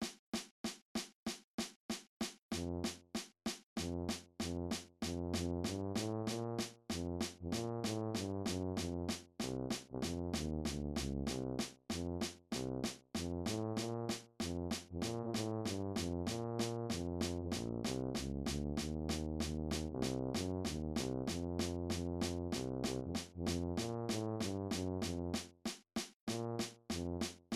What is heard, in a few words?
Just a Closer Walk cut time tuba; senior high band